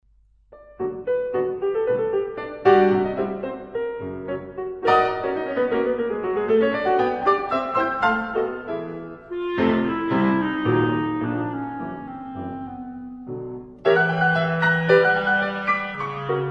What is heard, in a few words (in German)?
Klarinettensonate Vivacissimo; Reger; op49-2